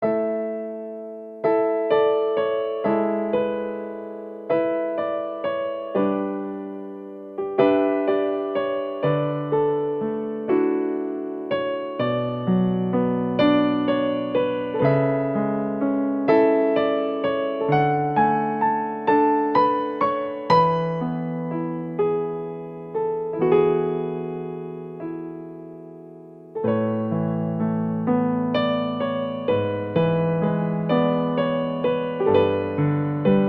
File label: Piano Cover